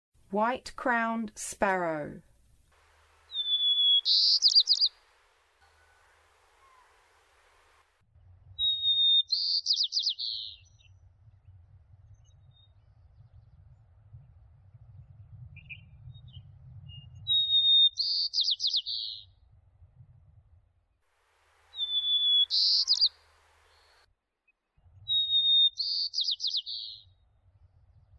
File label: White-Crowned Sparrow, Western USA Songbirds; North America